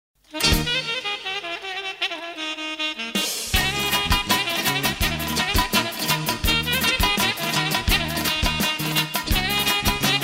Cocek

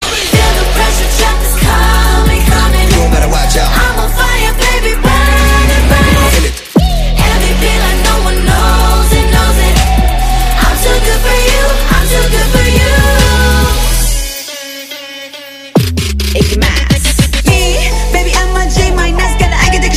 Trap; de free fire

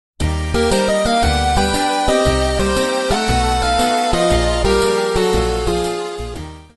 8 bits; Toy Story

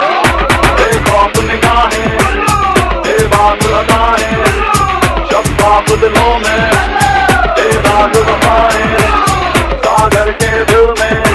Blue Theme Dhol Mix

